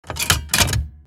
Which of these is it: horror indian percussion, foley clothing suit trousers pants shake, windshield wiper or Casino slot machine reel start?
Casino slot machine reel start